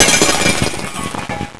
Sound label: crash